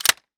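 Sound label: m203 open barrel, grenade launcher; ins2; weapons; handling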